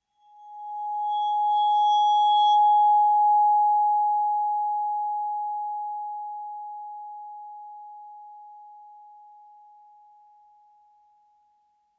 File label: Vibraphone bow Ab5 stereo; MIS Pitches - 2014; Percussion